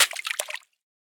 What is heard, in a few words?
grenade water; STALKER; IWP